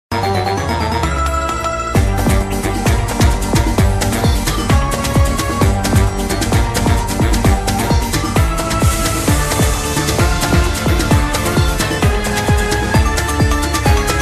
Nervous Tension

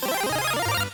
Power-Up sound

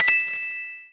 Coin

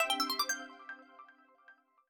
Super Mario Bros. Wonder's 1-UP jingle. (stereo)

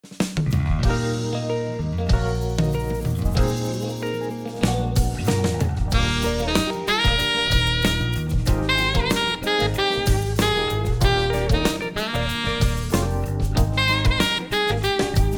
Chain-Link Charge Soul Arrangement

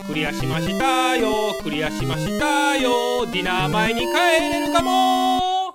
Course Clear; SMM; Arino KACHO

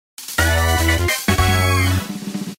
Final Lap

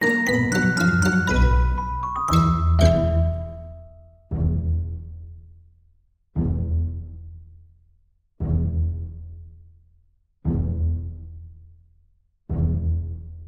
The music for a tie game; NL LGM; Draw

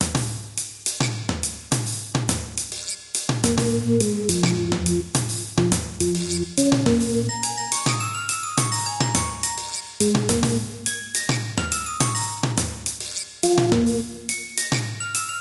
Game Over theme

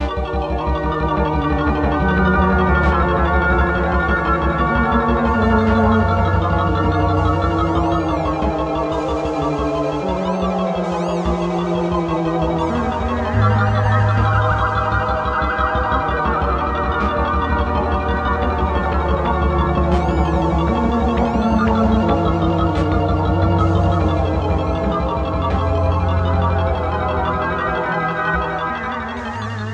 Castle Theme; SMM2; SMB

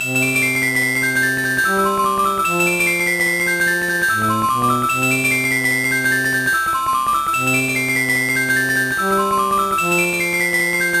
Throne Room; SMA4

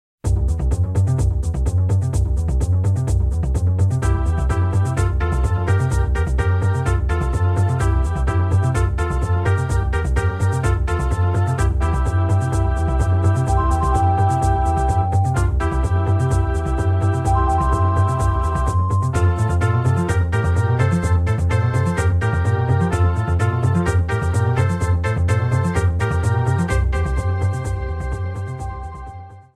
Music; MK64; Kalimari Desert

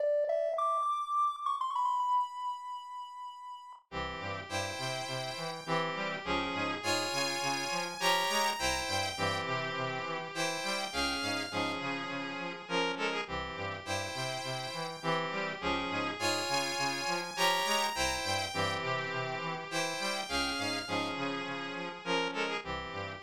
Whistle Sound & Warp Map

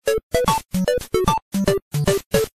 Game Over Theme; WC

